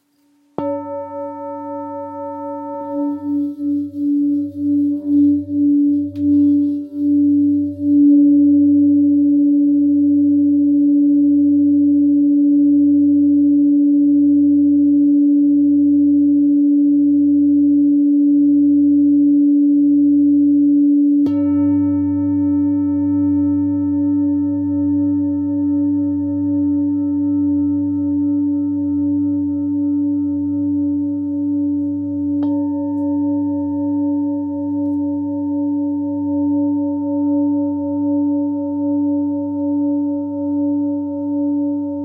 Bol Thick sol 2.38kg

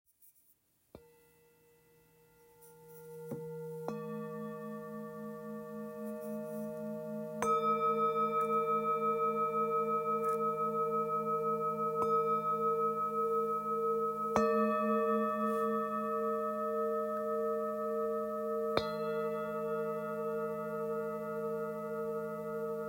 Set 3 bols ceremonie

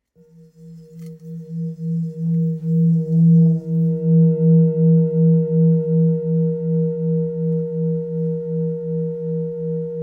Bol therapeute mi

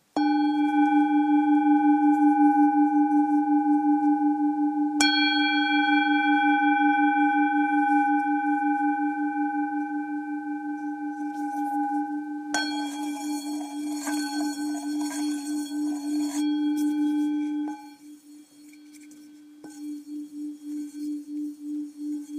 bol bengale 8-900g Re

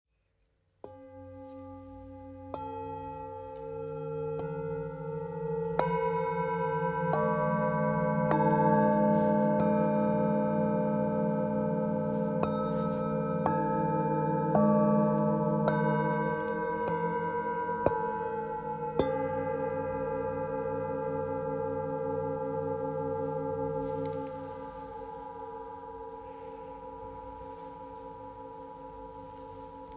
Set vibration

